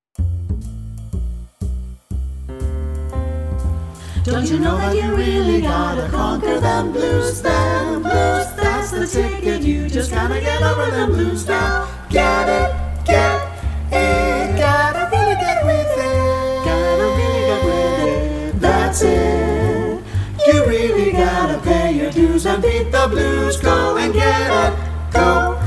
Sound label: Vocal Blues Etude All 5 Parts